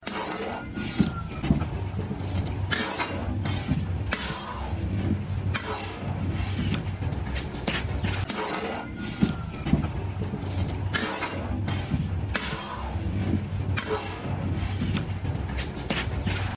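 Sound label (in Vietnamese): tiếng trộn đồ ăn khuấy thức ăn dạng lỏng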